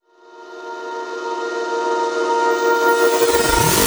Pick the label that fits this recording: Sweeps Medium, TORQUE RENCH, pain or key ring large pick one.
Sweeps Medium